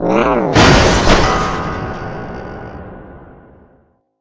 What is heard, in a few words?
Piano Fall; Talking Roby the Robot; Android, iOS, Mobile